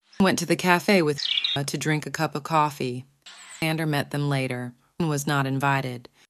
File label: masked bird noises